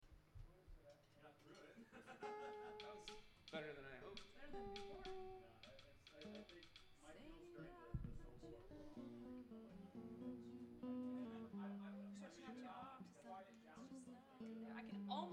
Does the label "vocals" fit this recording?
Yes